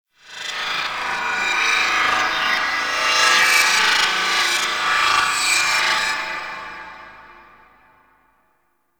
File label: Undercroft Rev Delay Warp Space One Off; Ambience; Warframe; Duviri